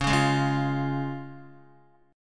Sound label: C sharp Major, Guitar; strummed; JGuitar